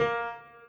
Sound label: piano intro